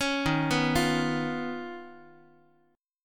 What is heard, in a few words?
Eb 7th Suspended 2nd Sharp 5th Eb, Guitar; x,x,4,1,3,4; Eb7sus2#5 arpeggiated; JGuitar